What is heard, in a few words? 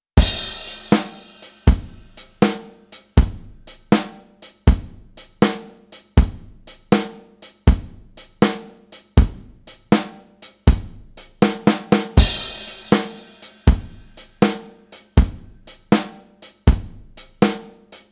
drum kit; blues blues